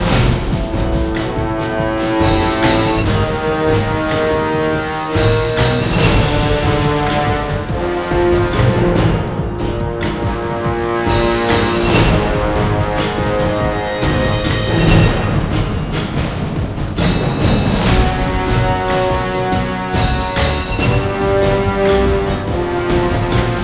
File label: chase guardian alt, music